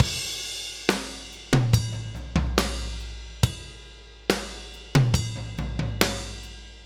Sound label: Loop, Drum Tools, Laid Back